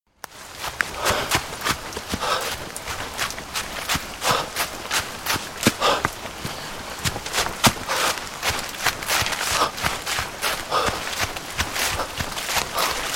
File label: Jogging In Rain, Out of breath man jogging in rain through rural landscape; Running (Sound Effects:Human)